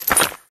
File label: button; block super match